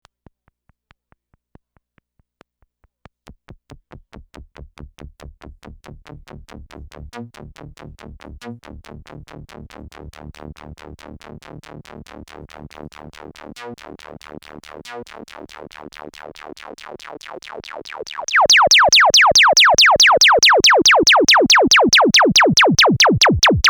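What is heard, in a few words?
bandpass Bass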